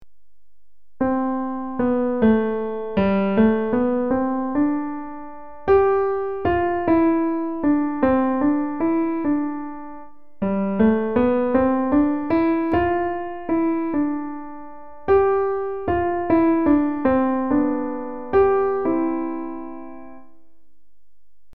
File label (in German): Melodie